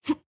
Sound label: Punch; Jackie